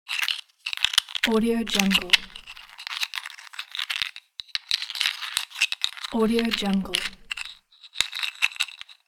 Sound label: cockroach